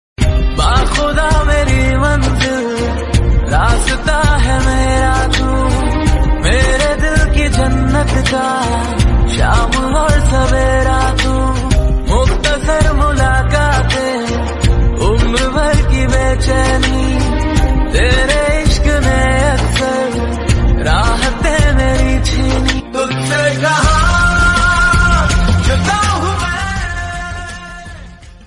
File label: Hindi Love Song